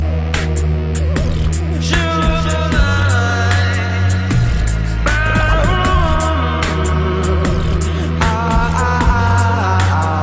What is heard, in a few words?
flow sax